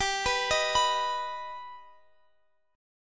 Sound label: G Augmented G Guitar Half Step Down